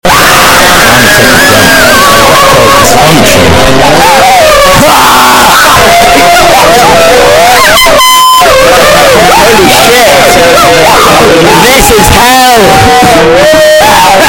Rip Ear For Alarms Ringtone

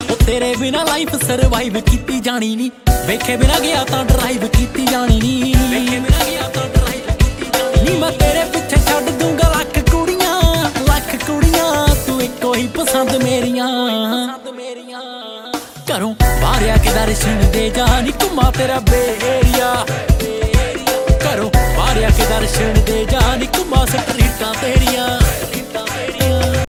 Dance Punjabi Ringtone

